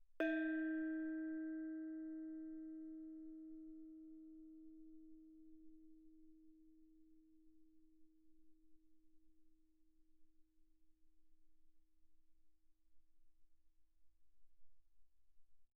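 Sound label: thai gong Eb4 mezzo forte, Percussion gongs & tamtams; thaigongs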